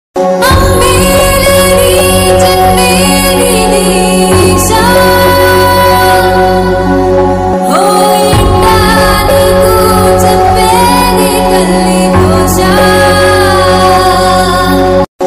emotional bgm ringtone telugu bgm; akhanda movie

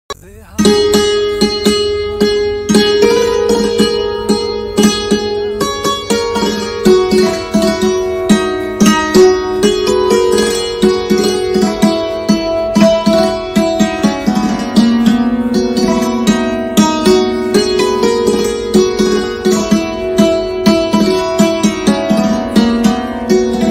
instrumental ringtone; hindi

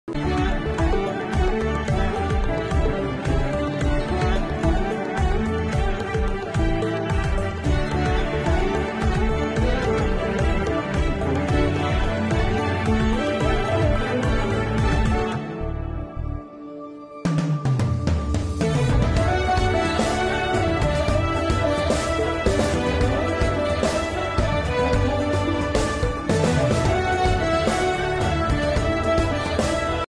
movie ringtone telugu bgm background music

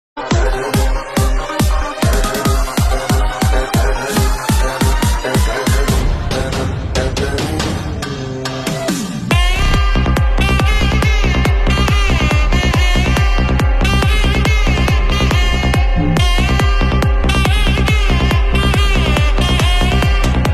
kantara remix ringtone bgm remix song

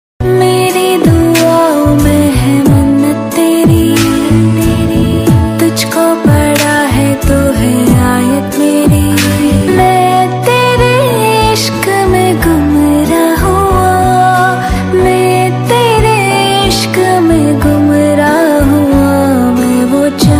new female love sad song hindi ringtone

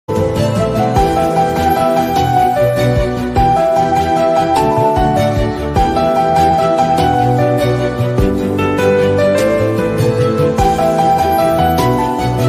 instrumental bgm

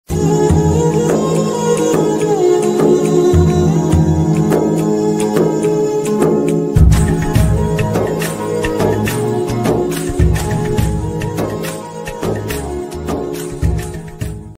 movie music ringtone; Kotha Bangaru Lokam

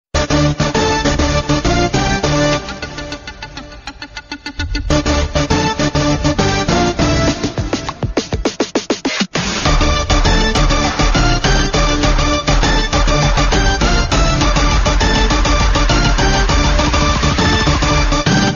interval bgm; agnathavasi; pawan kalyan

